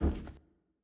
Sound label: Footstep Metal; Silco